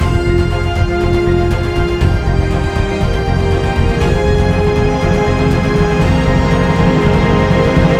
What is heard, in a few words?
Level Transition